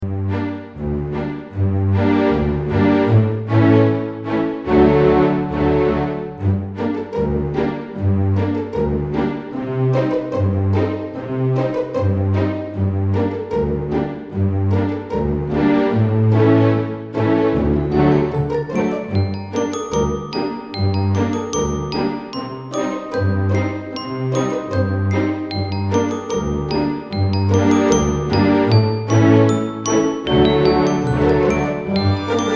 Halloween Music Funny Spooky Orchestral Music

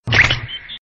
radar; dragonball

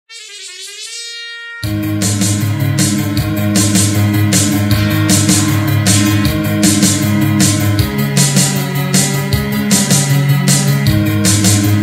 Instrumental; Batman; Cover 2019

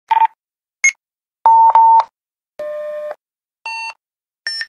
motorola mth800; motorola mth800 sound effects

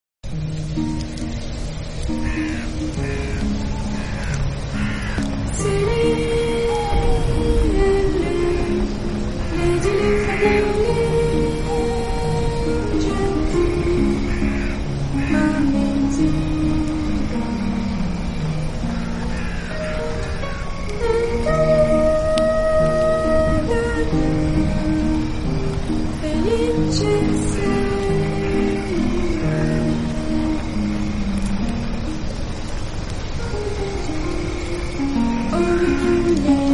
Relaxing Music; Coraline; Creepy

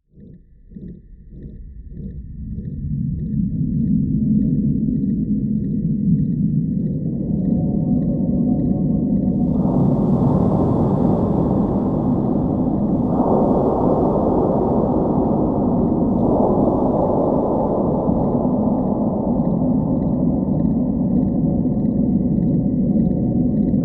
Tense Horror Music Loop Scary Forest

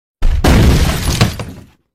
Crashing Sound Effect

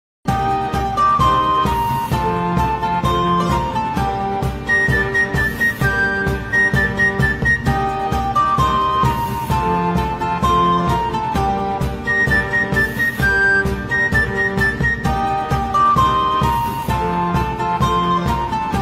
or in a medieval version Tavern Version

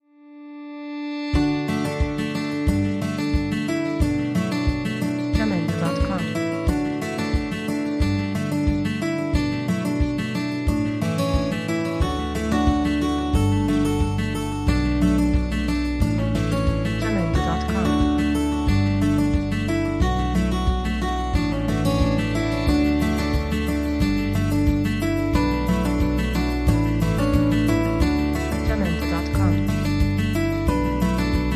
Acoustic Guitar Calm Background; ForestMusic

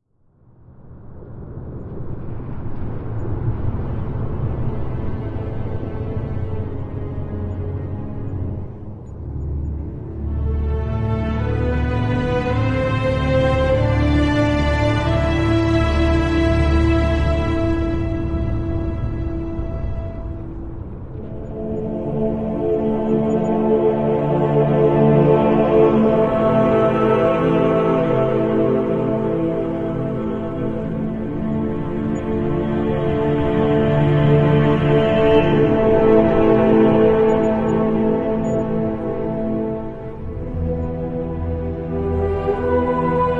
dwarven music with cavern ambience; moria lord of the rings music ambience

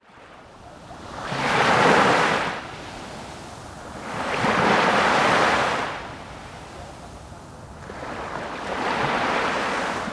ocean waves light surf on; salamisound

